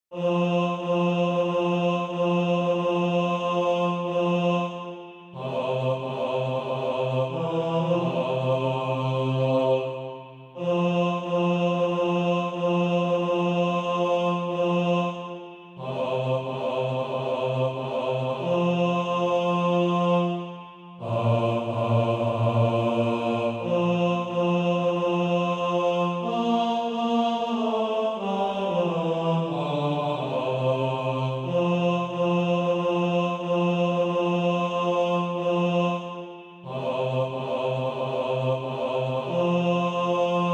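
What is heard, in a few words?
bass; Come to the Fountain; SATB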